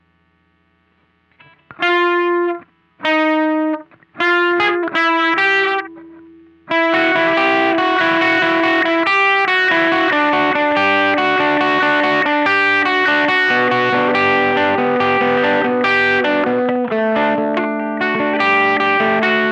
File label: Riff Slow